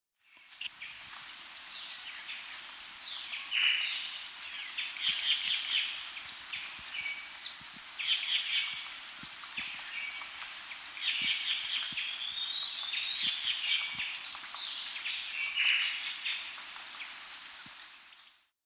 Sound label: Gray-Mantled Wren (Odontorchilus Branickii), Andean Birds; S. America